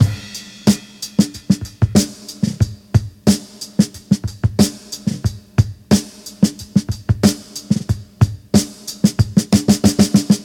92 bpm fresh drum loop sample G sharp key

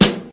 smooth snare drum sample G sharp key

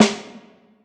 full snare drum sound g sharp

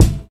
old school original kick single shot d key

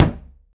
snappy bass drum single hit G key

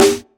damped snare drum sample g key, tuned drums g key

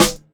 clear acoustic snare E key

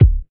nice kickdrum sample c sharp key

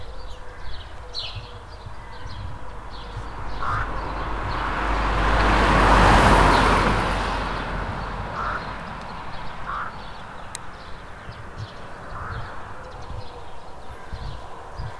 car passing by country; soundscapes

